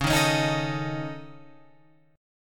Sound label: C sharp Suspended 2nd Sharp 5th C sharp Guitar Open F; C#sus2#5; strummed; JGuitar